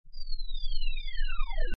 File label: Pink Noise Effix Delayed; Techno